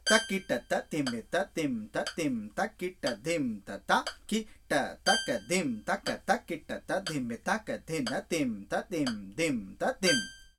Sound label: phrases tisra nadai